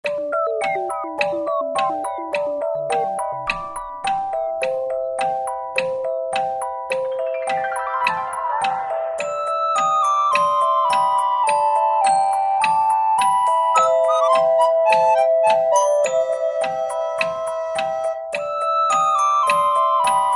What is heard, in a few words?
bgm night